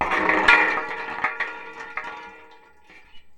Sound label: Tin Bucket, contact mic, Metalics